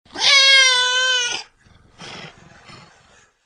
Pet Cat Howl; creatures pets

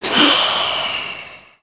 snore out

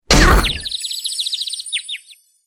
head hit; login screen

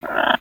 Bird Amazon Oneshots; Lego; ambience gnarled forest

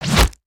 super jump flip

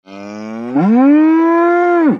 Pet Buffalo Happy; creatures pets; Lego

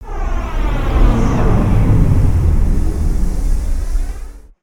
zoom in

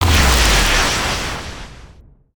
Spinjitsu Ice Tornado Mid Blast

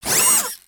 Fail Arm Drop; emotes; Overbuild

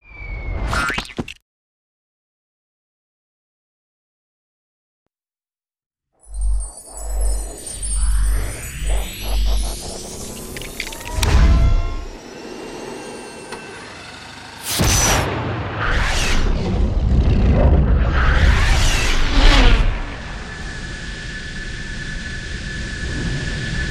rocket

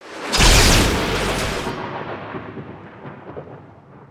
maelstrom strike; creatures organic

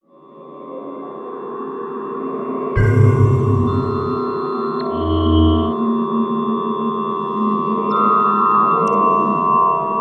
Venture Hologram AfterMath, ambience nexus tower

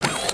Jet pack start; emotes

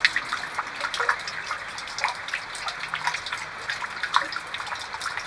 GF Water Drippage; gnarled forest

